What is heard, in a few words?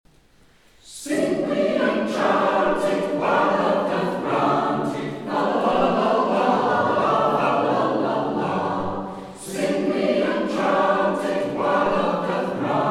sing we and chant it